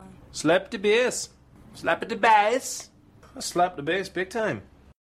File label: Slap Da Bass